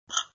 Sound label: Suck; Lewd SFX